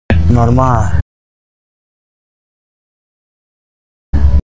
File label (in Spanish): cuervo; normal